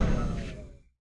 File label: Speedpad tail